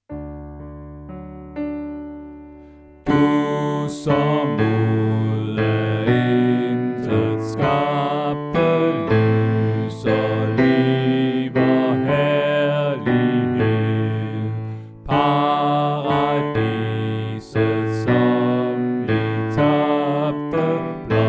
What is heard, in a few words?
Bas; oevefiler